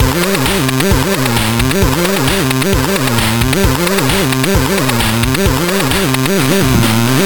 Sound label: ringtone